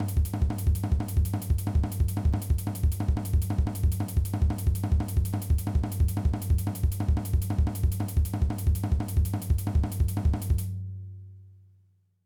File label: Chop